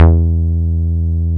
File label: Bass Long E; VEC1; Club; Long Basses